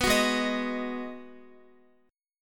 Ab Minor 7th down Guitar; Abm7; strummed

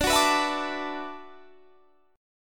E 7th Suspended 2nd Suspended 4th, D

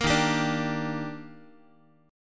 D sharp Major 7th, Guitar; D#M7; strummed

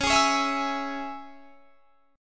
Db Minor Db; strummed

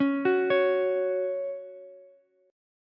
F sharp 5th F sharp Ukulele; arpeggiated; JGuitar

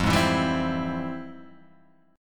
F Minor Major 9th Guitar Half Step Down

